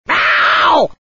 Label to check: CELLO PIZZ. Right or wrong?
Wrong